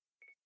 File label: camera focus, ui; Sharp AQUOS sense10 SH-M33